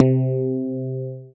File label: BAS FM DX-7